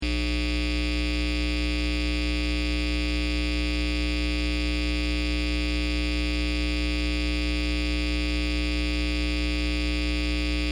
Electric Buzz Loop, Electricity humm, high voltage buzzing; seamlessly looping sound; Electrical Sound Effects; LynneMusic